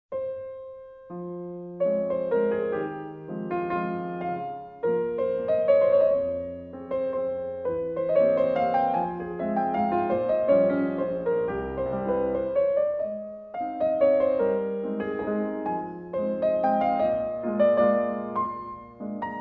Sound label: Waltz in F minor